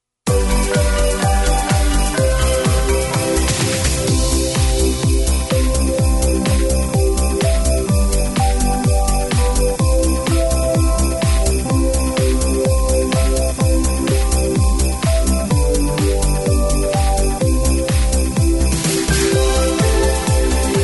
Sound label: oh christmas tree rhythm track, square dance music